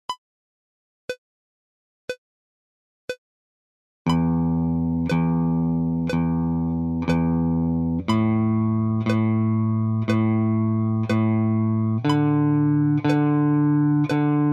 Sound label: picking practice